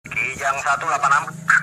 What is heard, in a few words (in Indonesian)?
Ht Polisi Ringtone